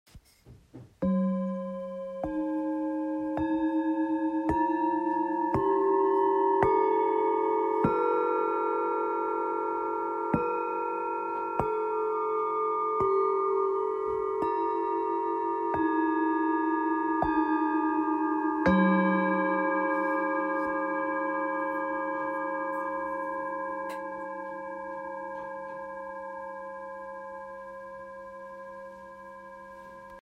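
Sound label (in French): Set 7 bols, bols chantants tibétains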